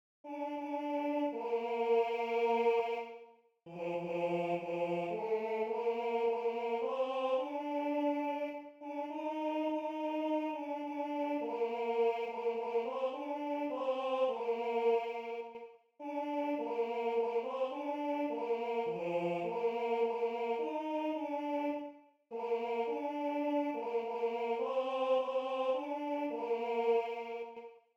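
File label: male choir, Tenor II; Rorate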